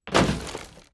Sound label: environment zone barricade break